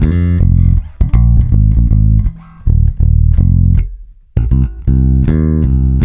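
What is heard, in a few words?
shuffle F, bass; Spectrasonics; Bass Legends; SHUFFIT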